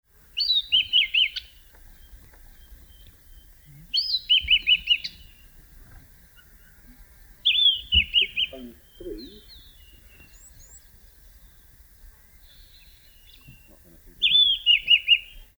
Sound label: red backed scrub robin